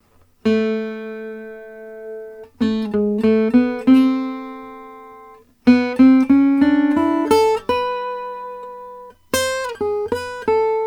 dupont md 50 65 rosewood

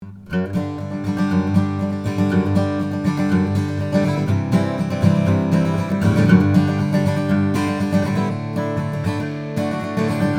martin d 41 indian rosewood

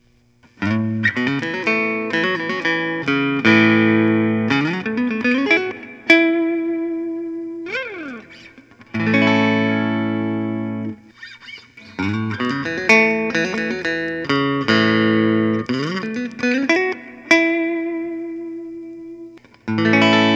collings 290 dc neck binding; throbaks wraparound bridge

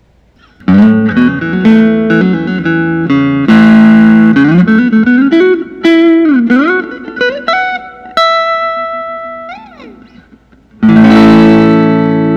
suhr classic t double bound 59 burst; 7 4 lbs; 1999

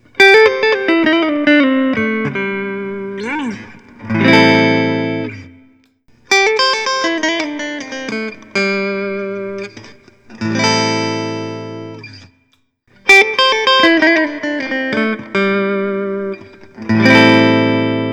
paul reed smith p22 trampas green

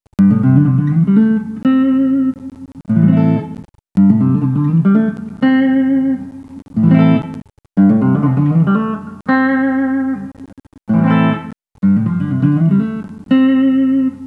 prs 408 maple top eriza verde

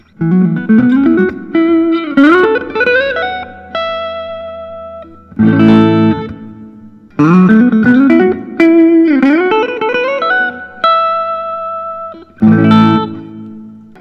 suhr aura; tea

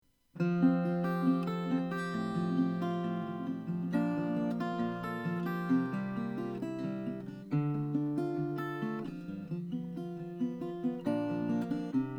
martin 5 28le terz indian rosewood

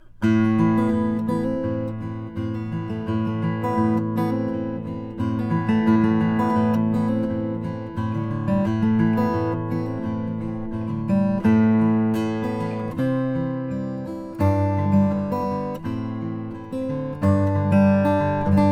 tippin bravado brazilian rosewood

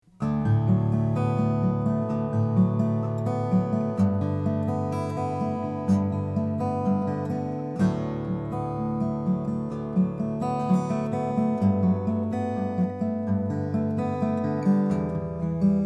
lowden f50c african blackwood, guitars acoustic

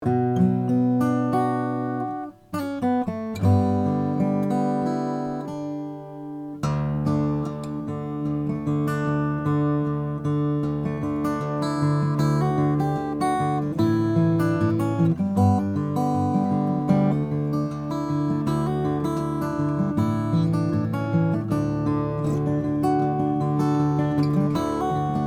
td heinonen om hybrid indian rosewood

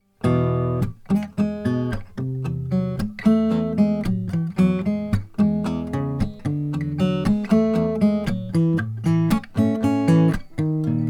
osthoff sj tiger myrtle

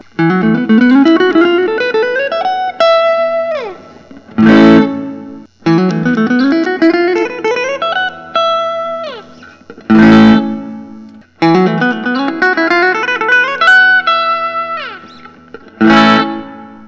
lentz hsl brazilian, Guitars Electric Solid Body; Hybrid Scott Lentz

